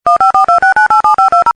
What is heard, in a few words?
phone dial; gmod; perp2.5